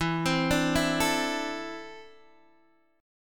E 7th Suspended 4th Sharp 5th, Guitar, DADGAD

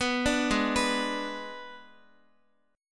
Ab Diminished Ab Guitar; Abdim arpeggiated; JGuitar

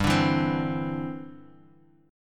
D 7th Suspended 2nd Suspended 4th, chord

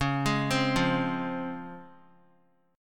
C sharp Major 7th; arpeggiated; chord; 25,27,27,27

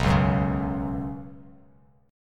B Suspended 2nd B Bass Standard, chord; strummed; JGuitar

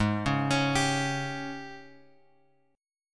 G sharp Major 7th Suspended 4th; G#M7sus4 Chord {4 4 X X 2 3} arpeggiated

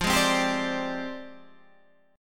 F Minor 6th Add 9th, Guitar Standard; JGuitar

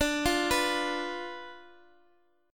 D Minor 6th; Dm6 arpeggiated; JGuitar

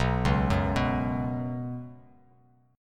B Suspended 2nd; arpeggiated; JGuitar

